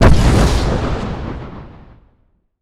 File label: Break Sound Barrier; Bittrip